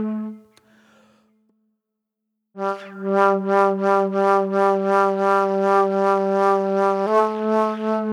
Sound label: Sweet Soul Flute